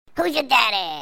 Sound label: Violine